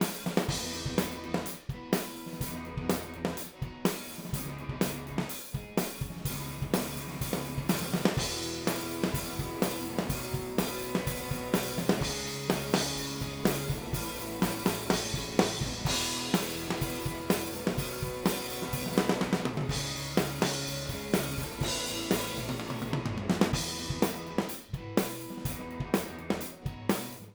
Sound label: Dual Array Wet Dry Drums Overheads